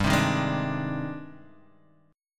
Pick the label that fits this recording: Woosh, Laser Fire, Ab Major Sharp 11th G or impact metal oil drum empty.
Ab Major Sharp 11th G